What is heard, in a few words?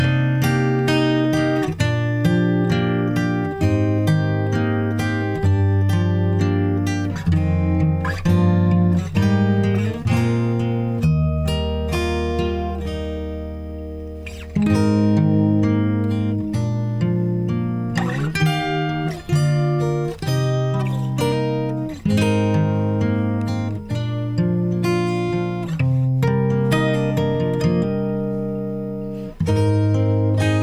Instrumental